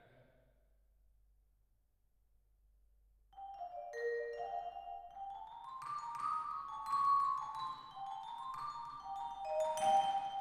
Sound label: Twin R Vibra